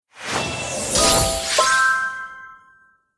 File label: attack; hero